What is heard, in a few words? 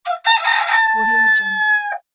cartoon rooster crow